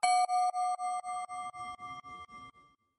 bell

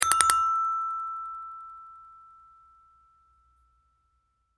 correct; 鉄筋を使ったピンポン×2回・中音ver; 正解の音・ピンポーン

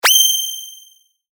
sword

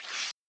Rip Up Paper

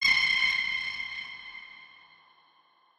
ghost

